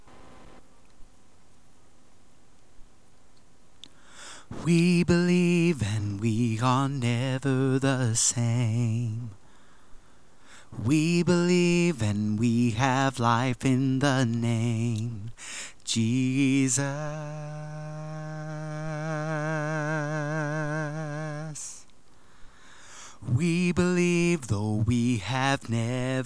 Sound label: Lead Vox